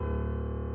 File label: key; keySounds